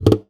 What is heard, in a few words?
Classical Guitar Extended Technique